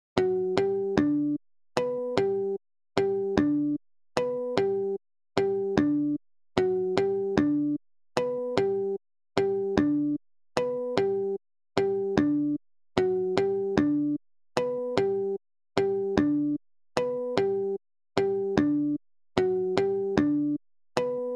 bass harmonics

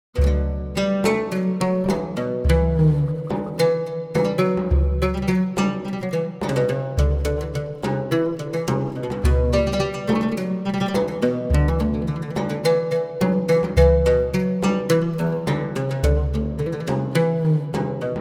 arabic oud; houbi eah

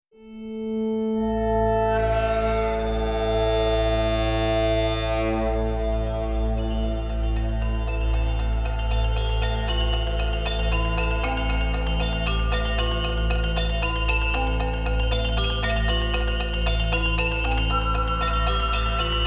amospheres

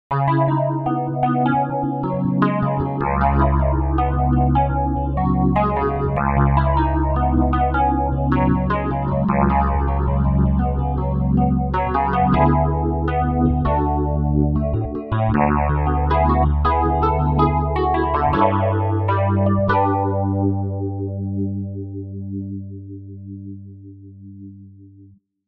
phaser; redd200

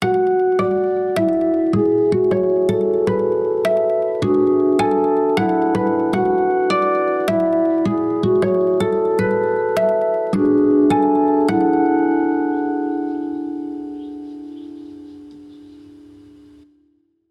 cloudburst acoustic

